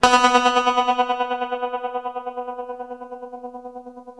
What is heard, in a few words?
TREM B 2, Guitar, TREMELO; East West; Steve Stevens Guitar Samples Collection